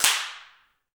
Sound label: Handclaps; Spectrasonics; Heart of Africa 1